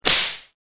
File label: whip